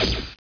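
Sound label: laser hit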